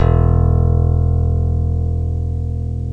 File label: ELECTRC BASS; OMI - Universe of Sounds